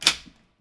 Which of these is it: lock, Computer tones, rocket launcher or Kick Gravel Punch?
lock